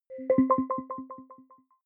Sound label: button echo beep resonance arpeggio